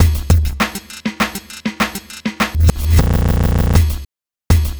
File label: Kick; Ableton Live 9 Essential Training; Processed